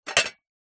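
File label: household plate dishes place onto stack of others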